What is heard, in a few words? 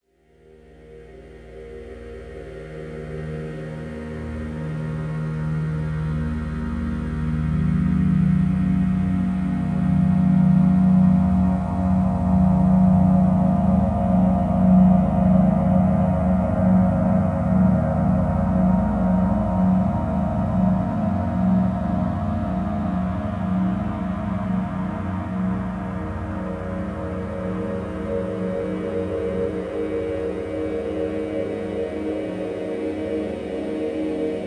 Deeper Velvet Wave, Harmonic Atmoshperic